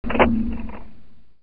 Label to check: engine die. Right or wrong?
Right